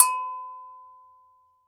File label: GANKOKWE, Gankokwe Bells, Percussion; Drums&Percussion; E-MU Producer Series Vol. 7 – Old World Instruments